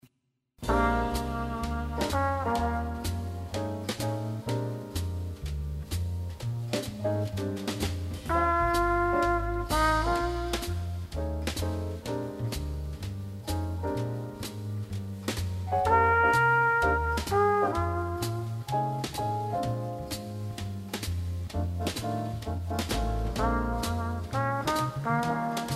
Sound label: Blues in Key of B flat concert exercice